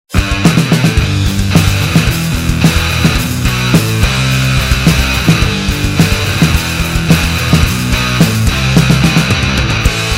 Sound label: 90's Mod ROCK; Soundzabound Volume 5